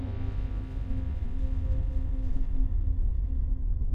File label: dramatic reveal, sound effects